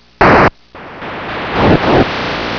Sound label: Killed; video game sound effects